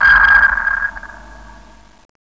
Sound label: ping